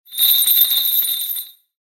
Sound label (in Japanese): 鎖を引きずる